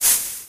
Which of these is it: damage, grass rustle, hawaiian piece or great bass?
grass rustle